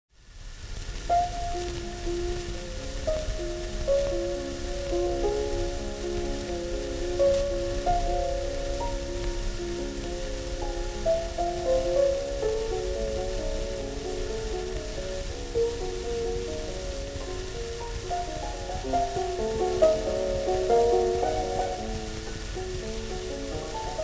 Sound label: Nocturne in D-Flat Major